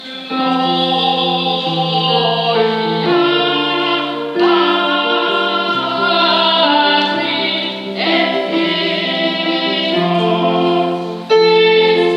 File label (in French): piano 2ème voix; laudate